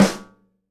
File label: SNARE RIM, Live Snares